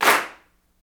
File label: CLAPS, Africa Handclaps